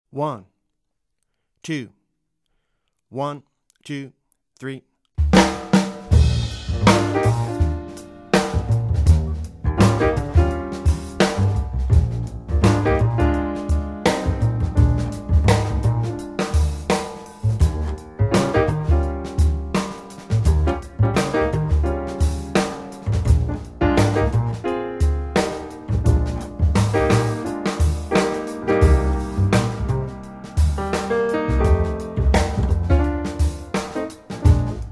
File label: Funky Blues Play along